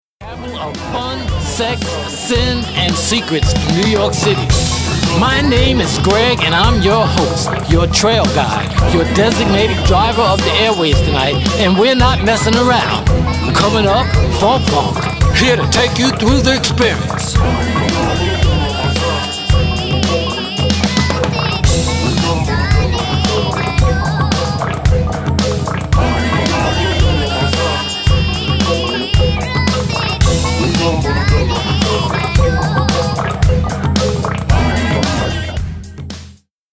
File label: G funk